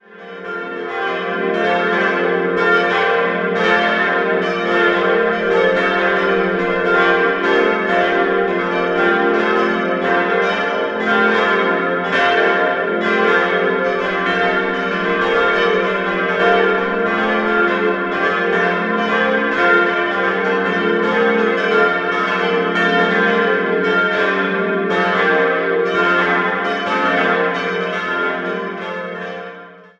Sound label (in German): glocken; Kolbermoor WK